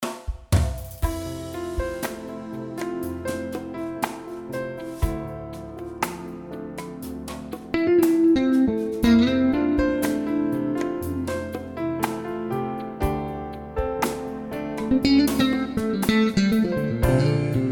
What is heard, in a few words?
E-Bass Solo Center